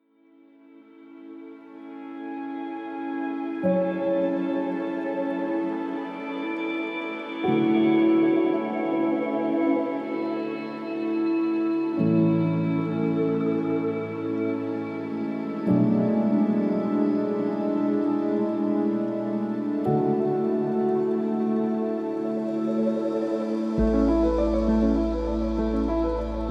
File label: Anthem